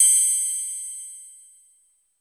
wipe

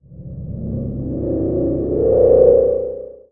power up

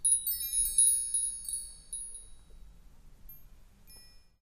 wind chimes

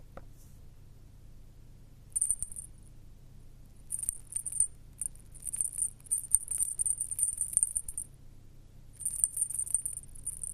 waving bell; operation

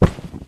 kick

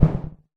swing; operation

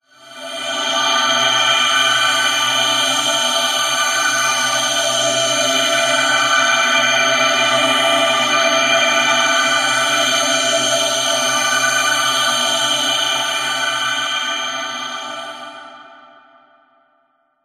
shine

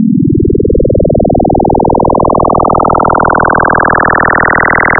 recovery

